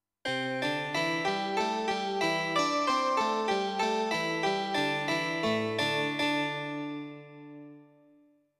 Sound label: Taisho-kin